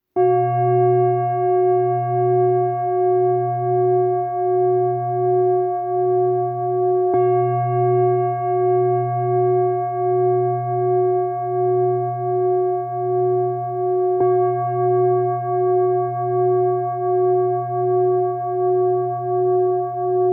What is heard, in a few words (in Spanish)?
Si jam, cuencos tibetanos